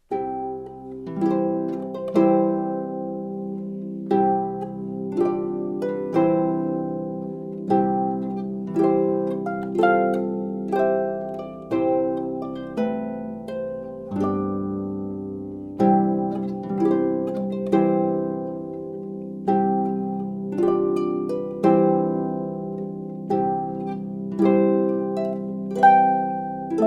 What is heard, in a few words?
traditional, Harpist